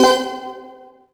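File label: success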